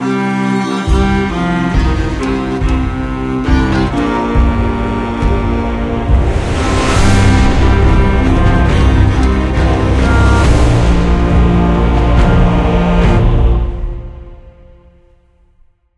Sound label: music puzzle melody; volibear